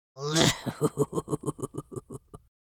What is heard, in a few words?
Witch fantasy vocalization laughing cackle evil